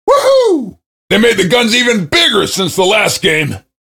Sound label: cheer; svencoop; voice commands; SeriousSam